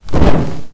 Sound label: fire fire staff double ended; game